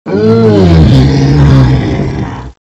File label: funny friend chase; slender; zerosiki